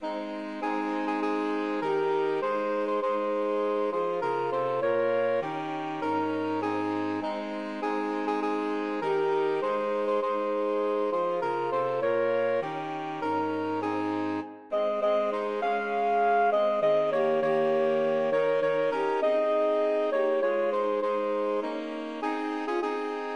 christmas tree SAX quartet

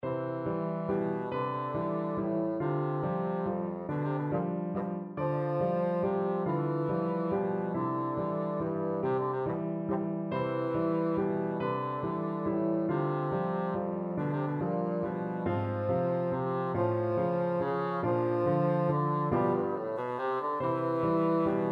tchaikovsky flower waltz, bassoon

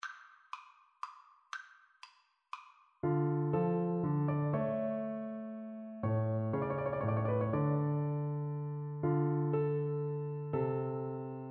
concerto grosso, Trombone

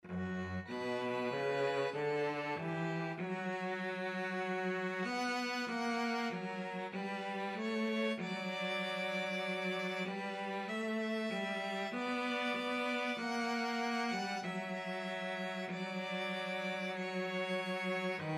violin cello duet; el cant del ocells

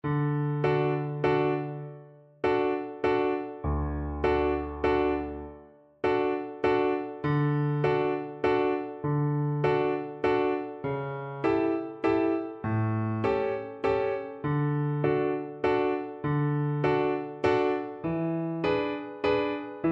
elephant, viola; saint saens

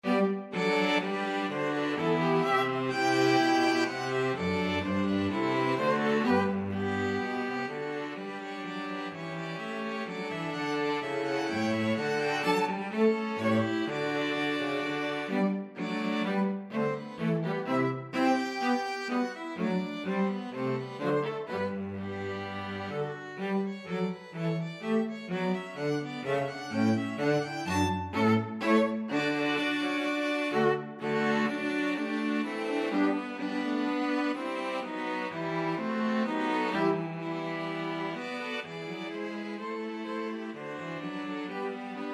bourree STRQ, string quartet; handel

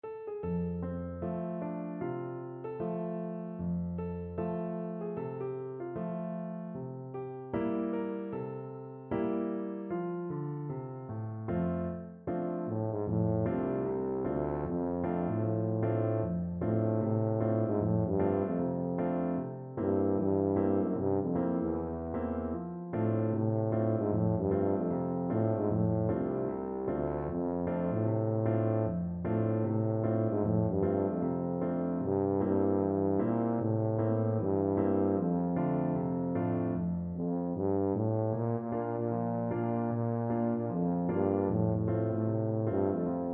down by the riverside, tuba

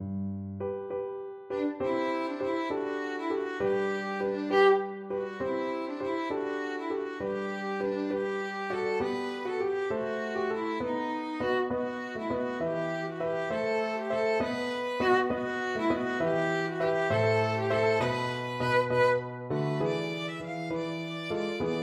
violin

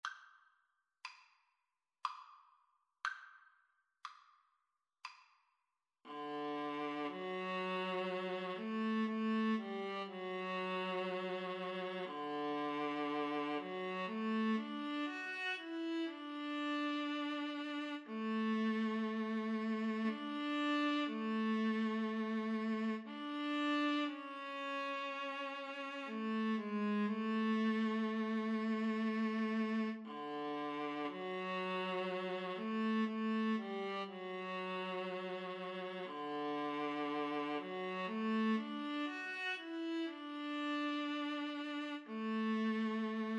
Viola Cello Duet

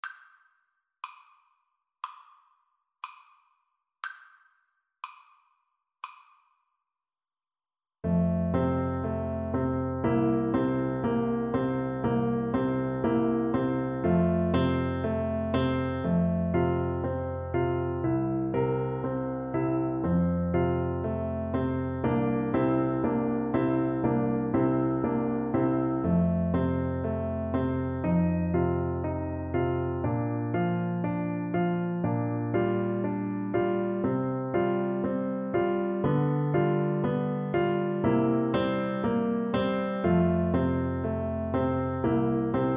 etude, Tenor Saxophone

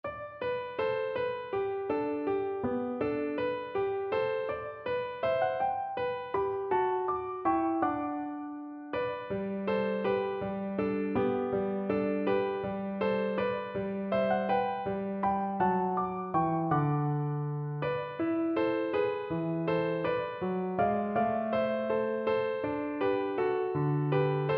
inventio PNO, piano

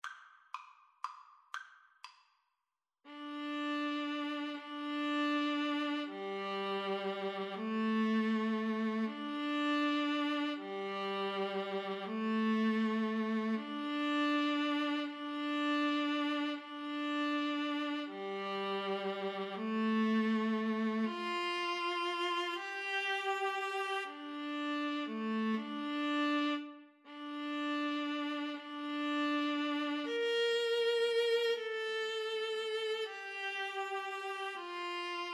Viola Duet

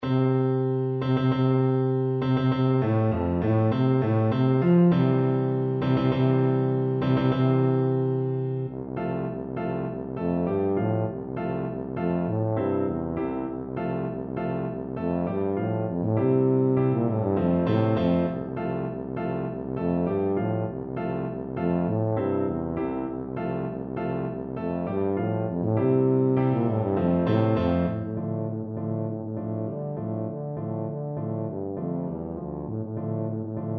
rossini william tell, tuba

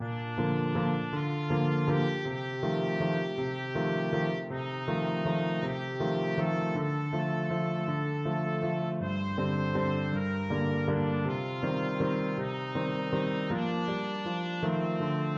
skaters waltz, trumpet; waldteufel skaters waltz

